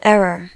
error

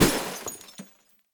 glass smash

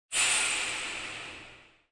metal reverb

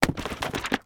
book drop

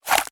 gun draw

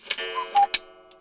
cuckoo clock

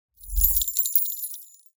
christmas bell

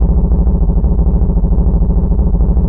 tyre bump surface

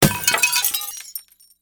light bulb smash

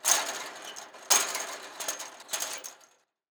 metal fence climb

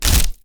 wood impact

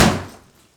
door kick

